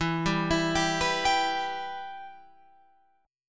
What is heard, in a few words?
E-Minor, Guitar, Open F; arpeggiated; JGuitar